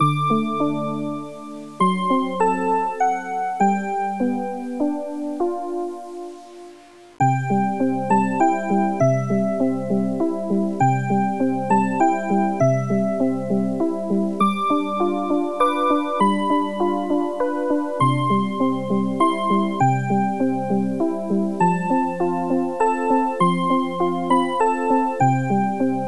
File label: silent night arrange